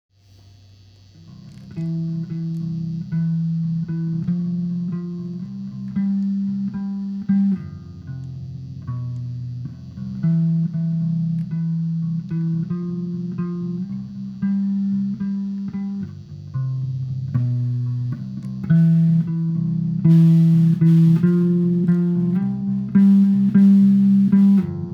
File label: bass riff (tune down whole step)